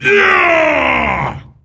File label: Attack Heavy; Jaraxxus